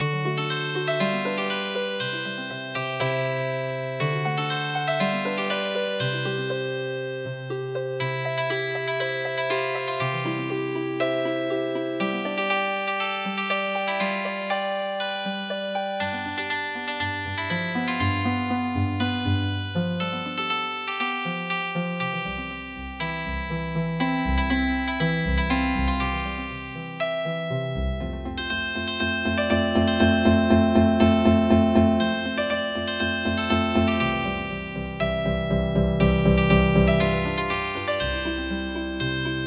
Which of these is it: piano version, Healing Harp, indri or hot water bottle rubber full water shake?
Healing Harp